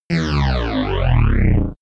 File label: synthetic signal